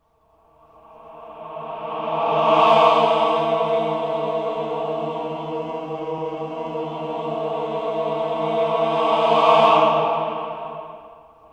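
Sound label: cluster reverse, voices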